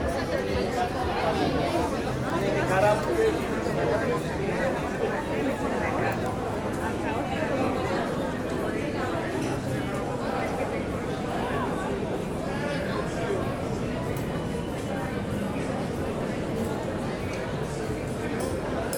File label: Taverns Street Chatter People Ambience Athens Greece